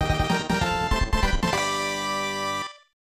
Daiseikou Fanfare; Momotarou Dentetsu Happy; SNES

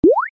Sound Jump; Dream Tower

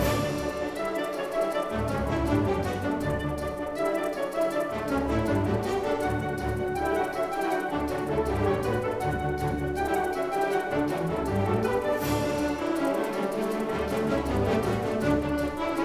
Music Segments Fight Joyfull Theme Bpm160 Loop; asterix obelix slap them all 2

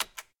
Button Press

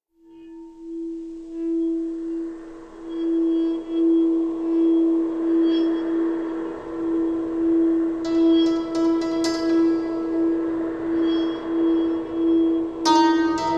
Desert; Wasteland Remastered

